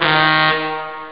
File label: saxo; speedball2; Amiga Soundtracker Sample Packs